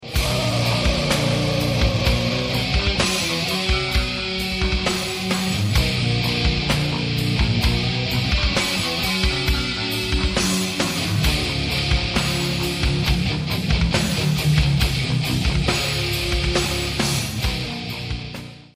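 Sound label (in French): guitare électrique riff; un autre riff de ma composition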